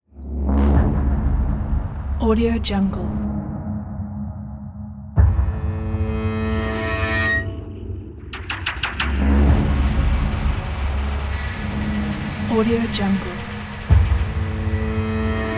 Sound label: in horror, آهنگ بی کلام ترسناک